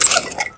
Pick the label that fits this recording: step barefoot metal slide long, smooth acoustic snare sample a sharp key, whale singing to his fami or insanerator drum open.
insanerator drum open